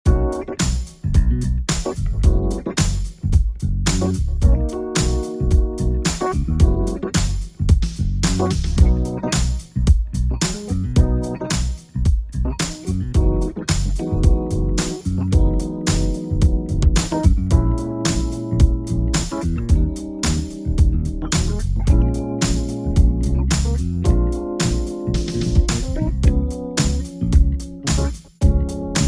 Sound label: Hot Disco Funk